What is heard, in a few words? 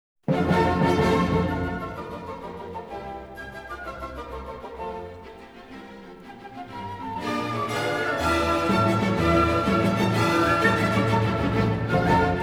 Music III (Stereo); Denon Audio Technical CD